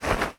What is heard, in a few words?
clothing shorts polyester shake; foley